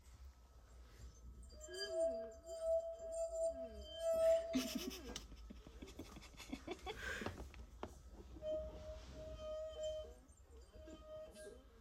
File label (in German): Die klingenden glaeserchen; Karneval der Tiere; Klasse 3c; Musikunterricht